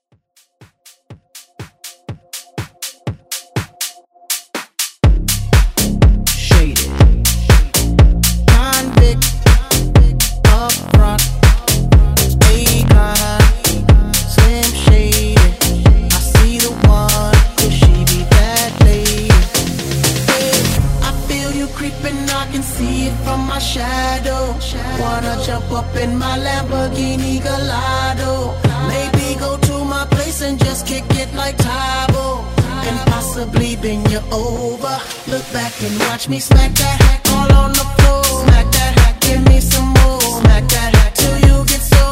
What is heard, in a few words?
House Vocal Edit